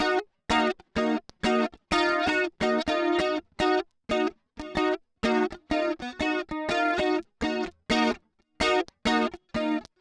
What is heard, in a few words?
gtr